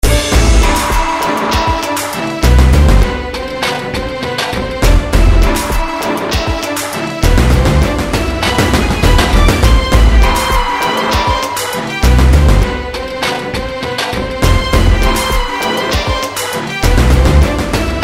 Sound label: loop